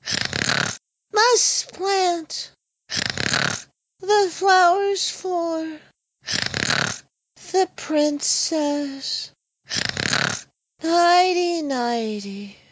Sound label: snoring; toad; sm64coopdx